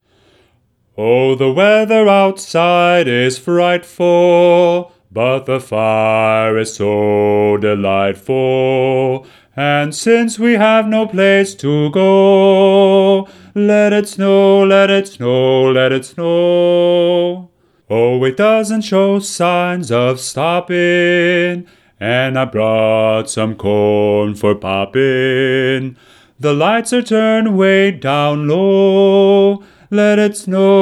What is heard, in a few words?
bass